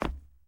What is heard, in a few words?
Step Run Wood; SandBox Musics